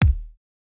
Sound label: kick, house